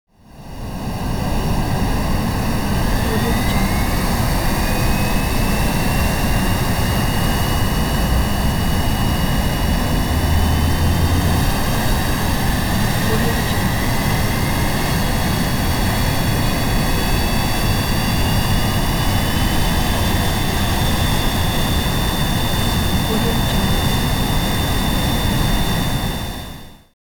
transportation jet plane boeing 787 turbine rev up; smartsoundfx